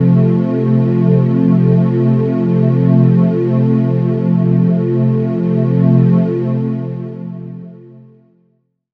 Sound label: Breath, Pads; Jungle Warfare 1&2